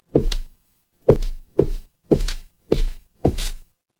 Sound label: footsteps wood